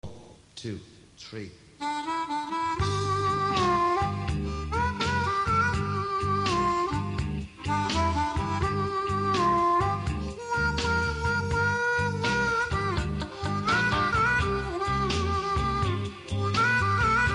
wah bash blues, harmonica

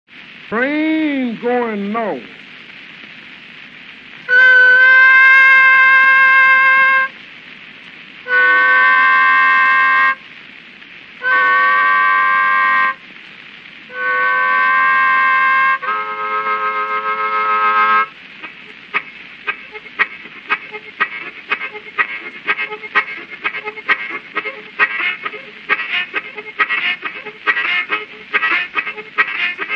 chickasaw, harp and train

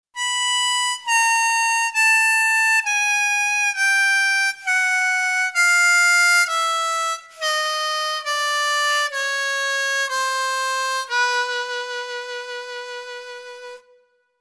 Overblow, harmonica

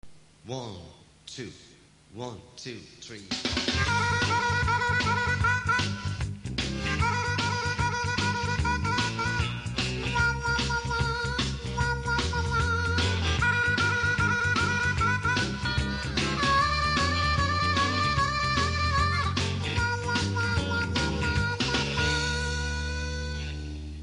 blues for elmer, harmonica

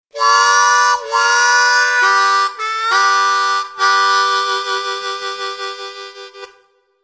Effects, harmonica

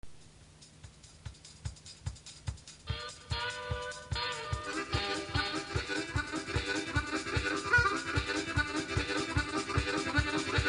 making tracks, harmonica

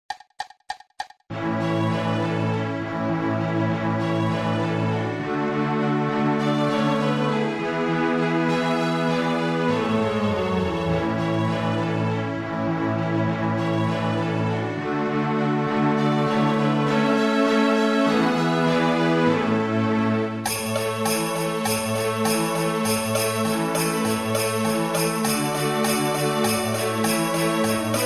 jingle bells a, harmonica

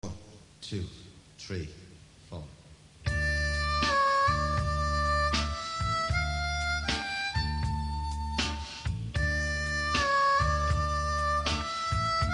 empty streets, harmonica

